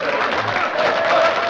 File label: chanting and applause